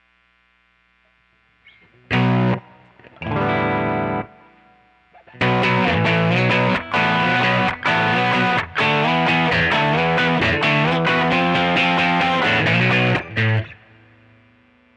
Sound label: quilter norm 61